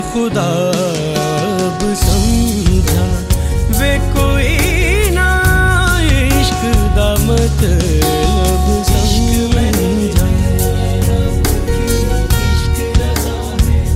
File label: music ringtone